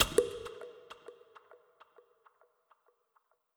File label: bbx